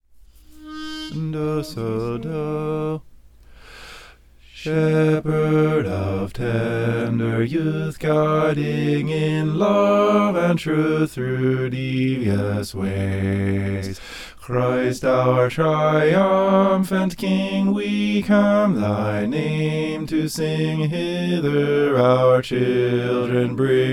shepherd of tender bass

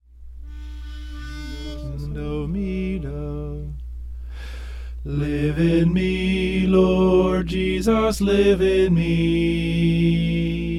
soprano

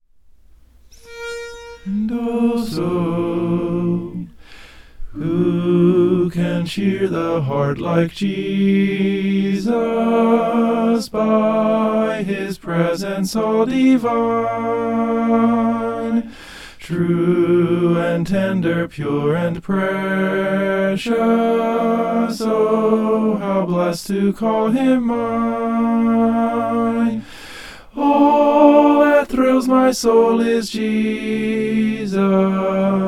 soprano

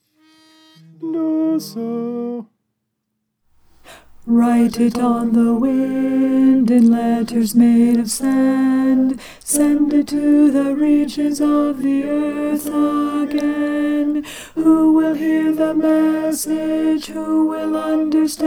alto

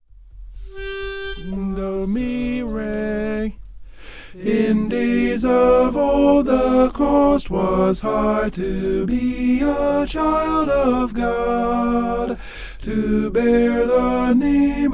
tenor; in days of old

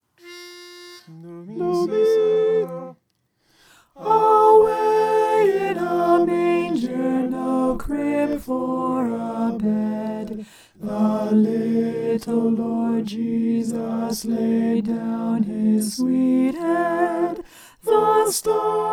alto

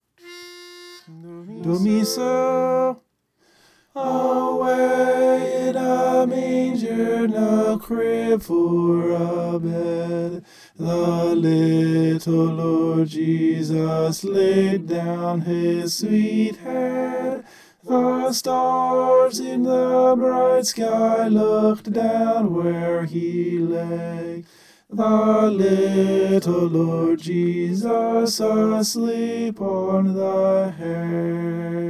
tenor

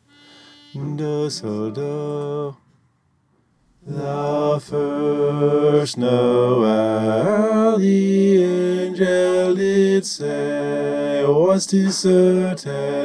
bass

